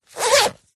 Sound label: luggage rucksack backpack zipper; zapsplat